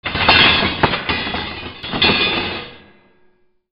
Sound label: FALLING